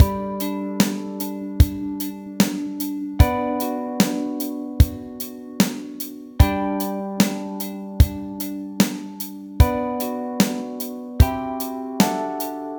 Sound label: 4 4 loop; vreemde maten